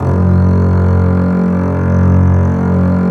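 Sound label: bass F#1; Digital Sound Factory Virtuoso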